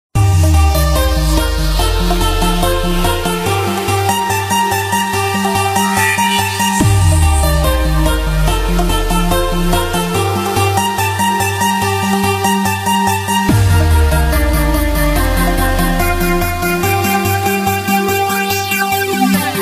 Nonstop Melody Bass Cực Mạnh; Hàng Bay Đám Cưới

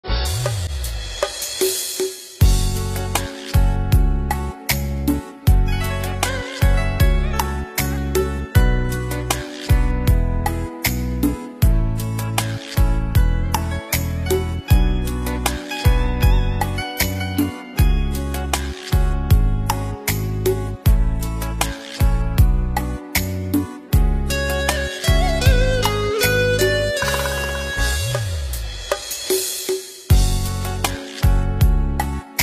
Rumba Karaoke Nhạc Sến Không Lời

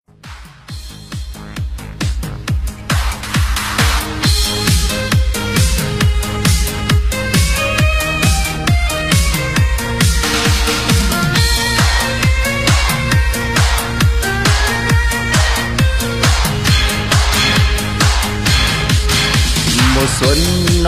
Lk Nhạc Sống Remix

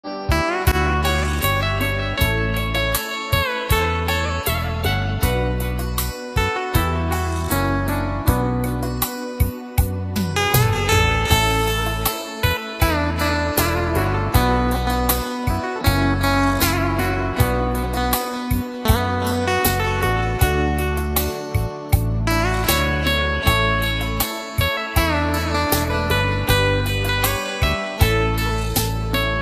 Hòa Tấu Guitar Không Lời Nhạc Rumba Bolero

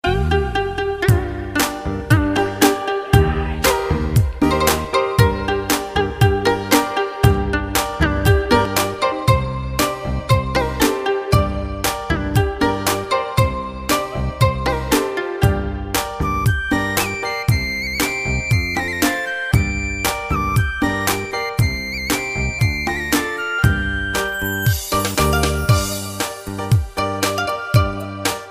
Hòa Tấu

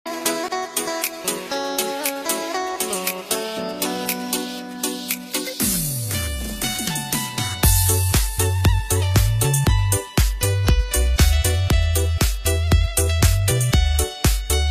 LK Bolero Disco EDM Bốc Lửa Nhạc Trữ Tình

